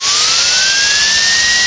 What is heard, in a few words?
Pedastal Lifting; kids-tetris; Lab